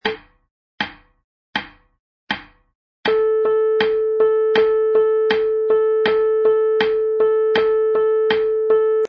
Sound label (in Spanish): sincopa; Se puede escribir con ligadura de una corchea a otra, o como dos corcheas suman una negra, escribir esta directamente.